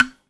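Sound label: BAGLAM PIZZ E 5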